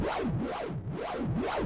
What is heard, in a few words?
Time Rewind; Timelock VR